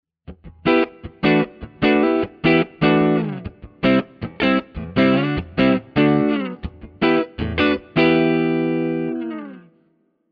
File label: Bassman Normal; 1964; 1964 bman ultimate